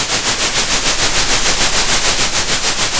center, Sound of a train

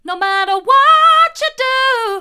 hard, vocal

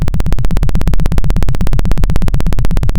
pop, Sound of a helicopter

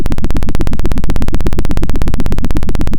Sound of a helicopter

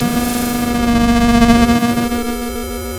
pop

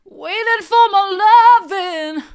waiting for my loving mid, vocal

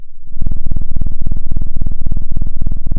pop, Sound of a cat purring

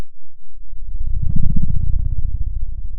Sound of a dinosaur

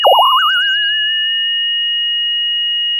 Sound of a bird tweeting nicely

